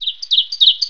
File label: Birds; Buggy; PS1